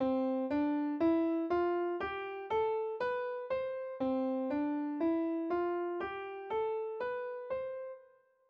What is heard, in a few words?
slur